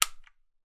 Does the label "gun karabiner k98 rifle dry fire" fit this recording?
Yes